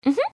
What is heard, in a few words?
"mhm"; pony; Twilight Sparkle